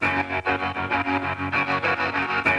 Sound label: GTR